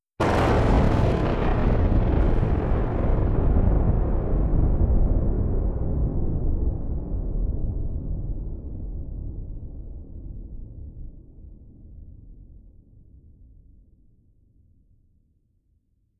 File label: sound reverberates; sound effects and sound reinforcement; The Passion of Dracula